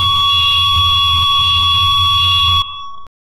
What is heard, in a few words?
low pitched mechanical; lava